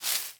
樱花树叶踩踏声

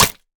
山羊角断裂声

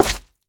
蛙明灯破坏声

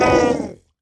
受伤声; 嗅探兽

